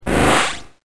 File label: ZIP GUNS